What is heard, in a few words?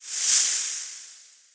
snake